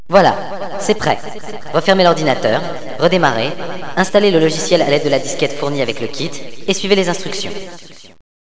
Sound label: kit; Villagina; ecole